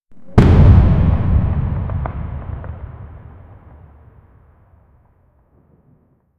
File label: battlefield